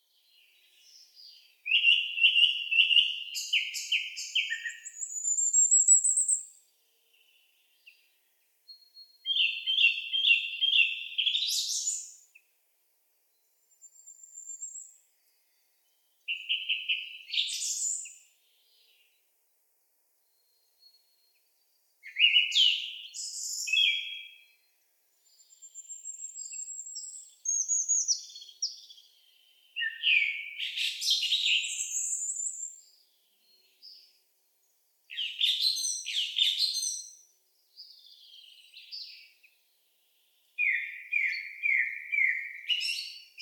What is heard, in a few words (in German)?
Singdrossel Turdus philomelos; Vogelstimmen